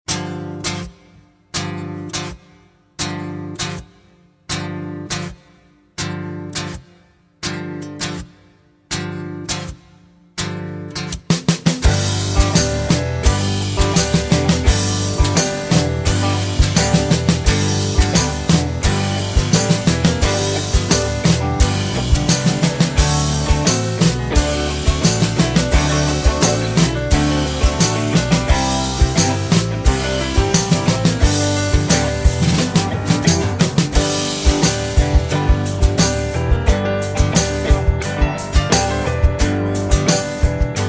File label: G No Backing Vocals, backing tracks